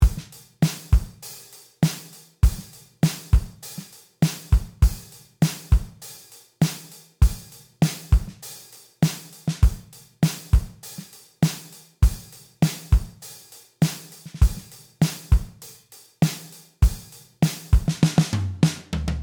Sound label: Drums Dry